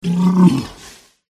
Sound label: zorb attack voice bottom